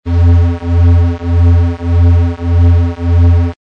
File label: comm signal slow tones